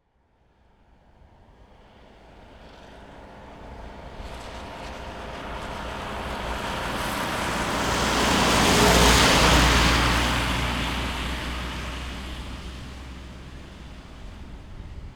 renault master 2.3 dci wet asphalt ext pass medium speed; Soundholder